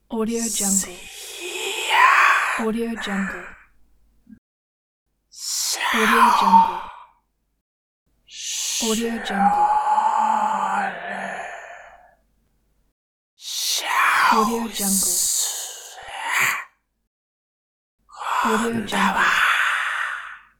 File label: the voice snake